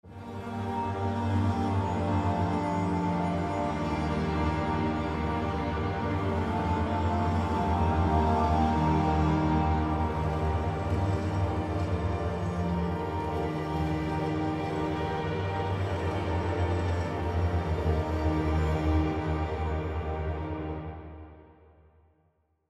Brushed Oceans Pad; ASCEND

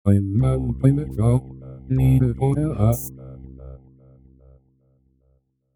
DDrum

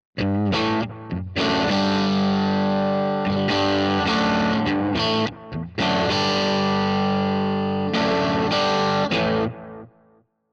Tweed Drive

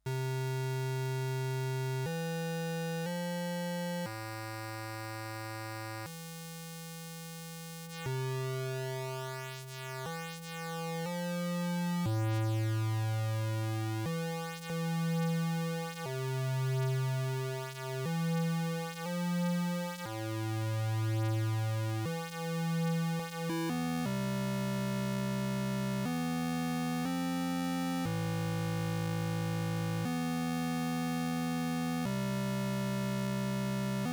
AY3 Osc detune

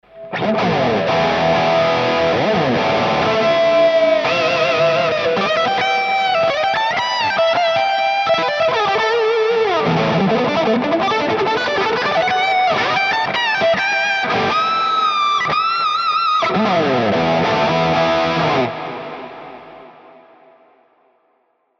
TwoNotes OPUS BigSky Delay; Preset 44 Shreds not dead

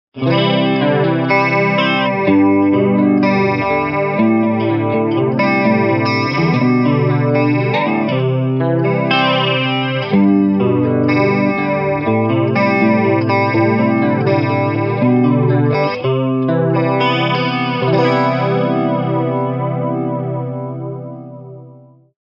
Classic Clean

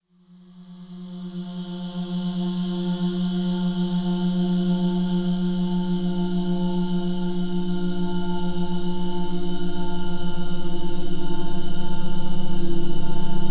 reverb extreme; soma the pipe

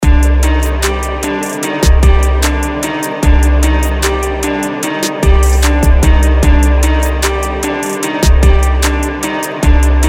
Hip Hop

